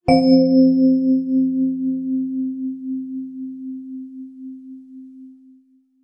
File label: long dang; wake-up tones